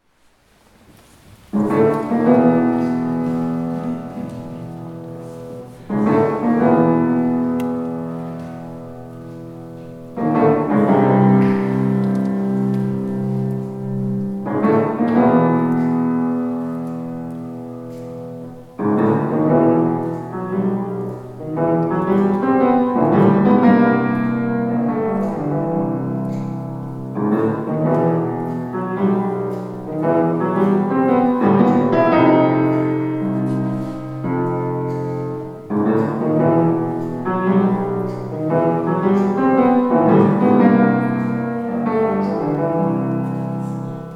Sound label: Piano Solo